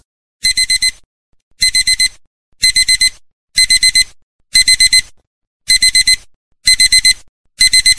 Best Alarm Ever

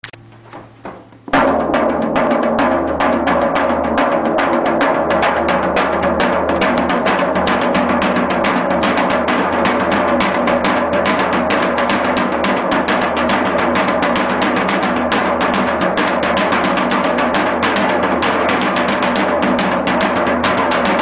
Drum Beat

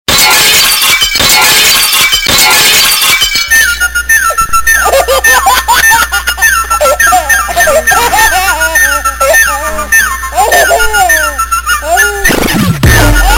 Mirror Breaking

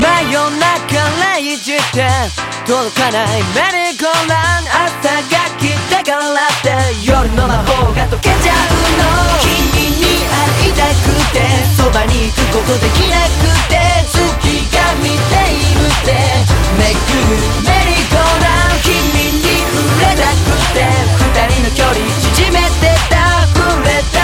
Deep Night

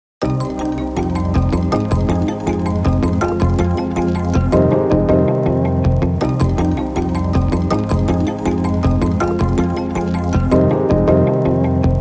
wonky beats

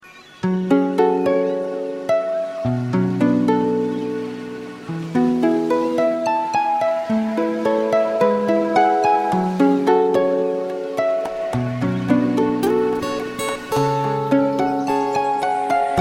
Beautiful Piano

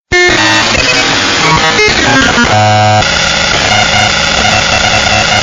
Static Sound

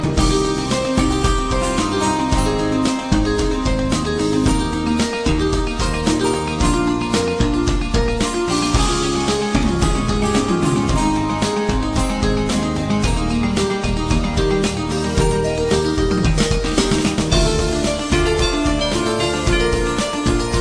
Music Machine String

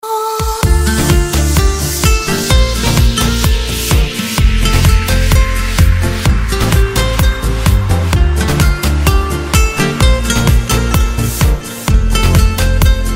guitar

